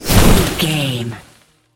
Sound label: nature spell vines blast impact